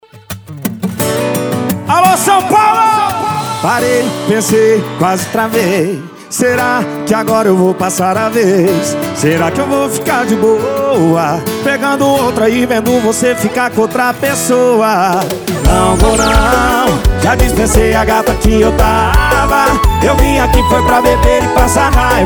sertanejo

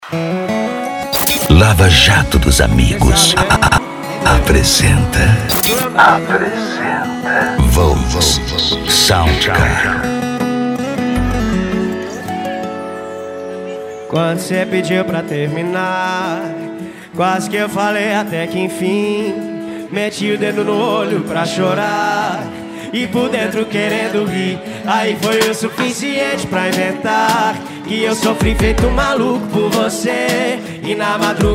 sertanejo e pisadinha; cd volks sound car

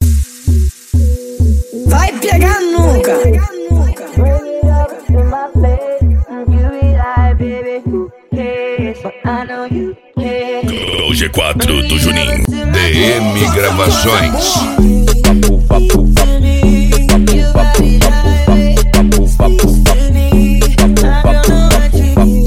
eletro funk

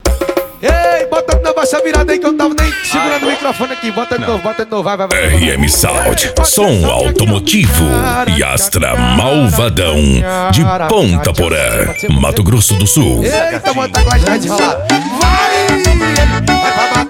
som automotivo

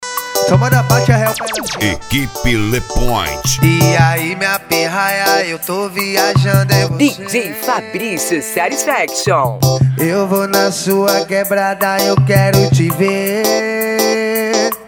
deep house e pisadinha

